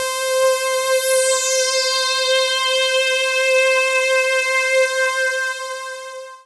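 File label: PAD, atmospheric pads; Best Service; XX Large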